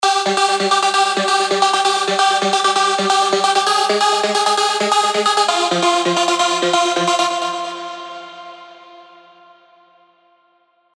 Rave Generator, big room techno drops; Revealed Serum 2 Big Room Techno Drops Vol. 1

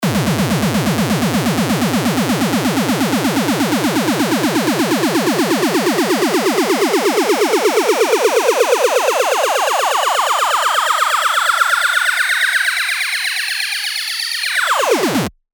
FX Uplifter (8 Bar); revealed serum 2 bass tech house vol 1

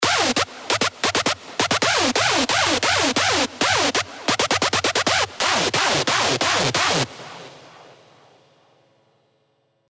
Screech Prescription; revealed serum 2 rave asylum

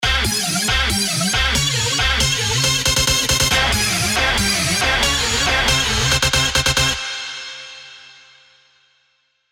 Stab Funk Crunch, big room techno drops; revealed serum 2 big room techno drops vol 4

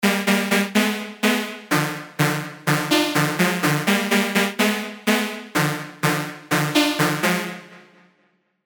serum 2 house stabs; alonso saloon serum 2 house stabs; Speaker Check

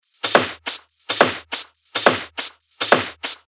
140 BPM, techno loops; Marchenko; Revealed Techno Top Loops Vol. 9

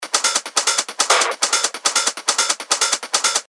140 BPM, techno loops; ReGen; Revealed Techno Top Loops Vol. 9

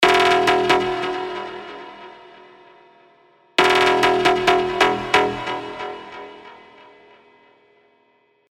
Stab FM; revealed serum 2 big room techno drops vol 1

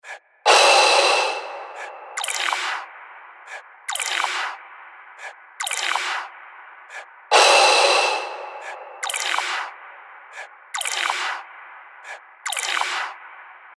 FX Loop 140 BPM Exhale; revealed fx loops vol 2

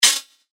FX Hat, cymbals; revealed cymbals vol 1

